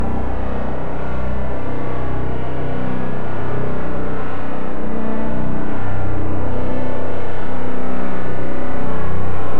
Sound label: robotic space texture 100bpm; synth loops